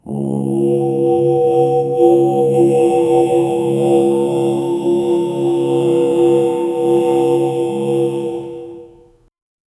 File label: gregorian chanting